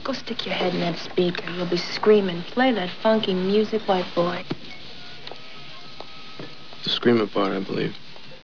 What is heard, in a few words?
screaming